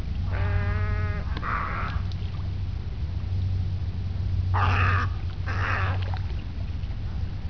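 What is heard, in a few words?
Little egret, birdcall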